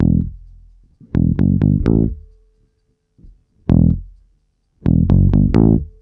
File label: HOT BASSE, Roots Disco; USB Soundscan vol.32; AKAI